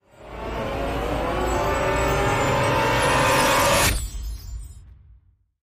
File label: before big win tension; jungle spirit